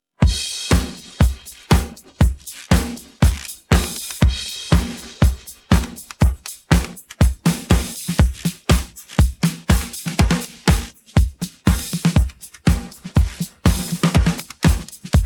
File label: drums pattern